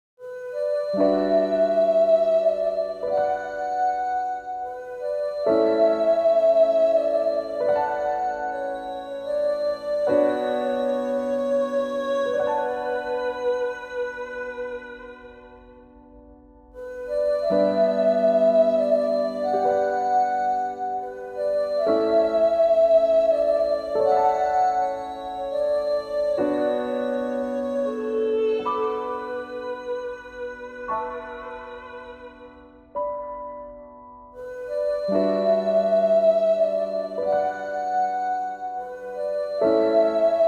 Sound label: Gentle Lullaby; Nayuta no Kiseki; PSP; Creha